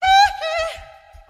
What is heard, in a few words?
hee hee, laugh; ooh ahh